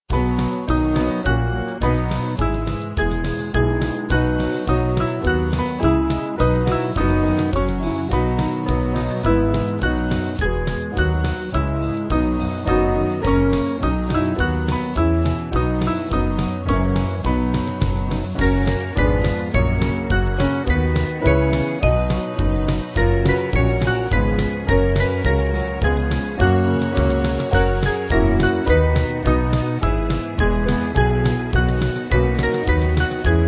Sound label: Easter Hymn Band; Christ The Lord